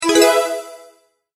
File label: multimedia tone advance; Sound Design; McKinney Sound